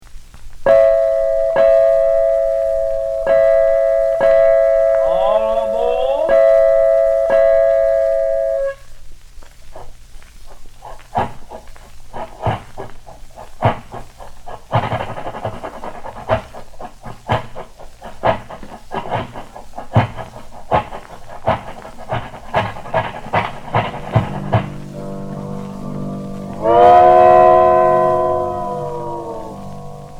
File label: Train Song